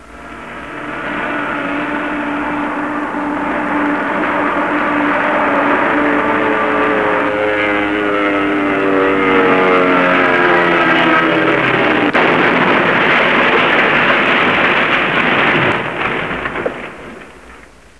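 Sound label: PLANE CRASH; trasporti; aerei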